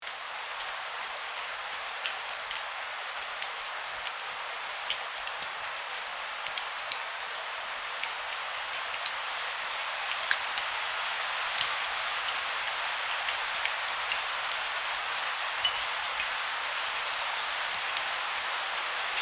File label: rain; daynight